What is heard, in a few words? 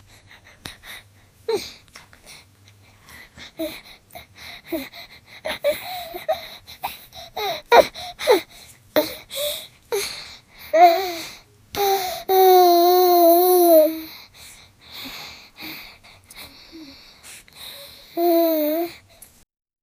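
a child with shallow breathing, crying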